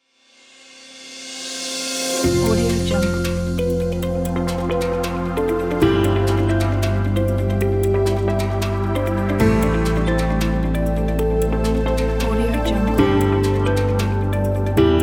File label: corporate trailer logo